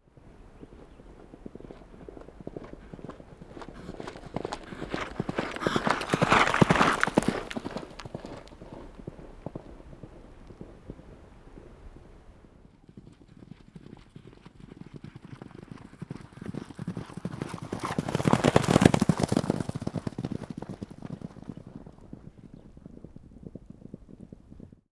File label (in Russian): dva proskoka loshadi po zemle